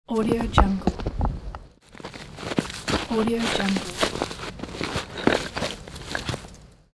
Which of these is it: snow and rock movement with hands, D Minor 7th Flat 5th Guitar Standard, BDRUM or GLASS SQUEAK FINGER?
snow and rock movement with hands